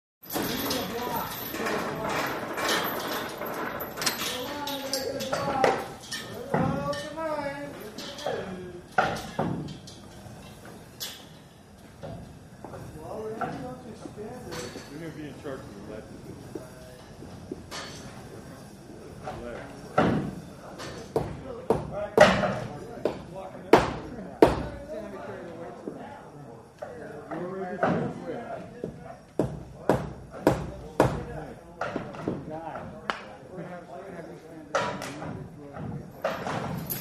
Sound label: Construction Site Ambience 1; Interior With Close To Medium Perspective Voices, Welding Torch Blow, Chain Movement, Lumber Sawing And Movement.